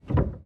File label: piano release